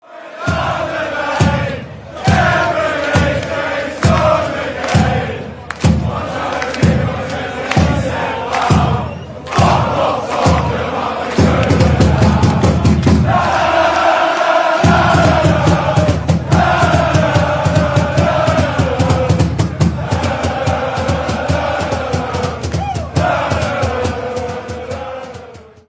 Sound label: chant, fanchants; eze; england